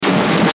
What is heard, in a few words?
Static Short Burst Distortion, Static Hiss Filter Airy; Production Element Imaging Element Accent Transition; Blastwave; Static Sound Effects